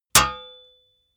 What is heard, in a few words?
metal impact sword plate; hits explosionen; metall